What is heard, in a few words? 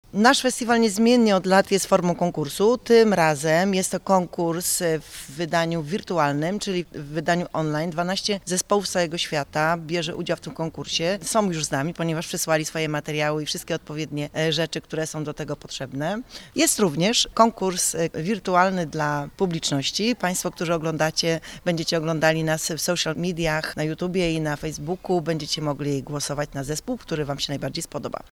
Folk